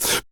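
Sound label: vox percussion, Vocal Planet Jazz FX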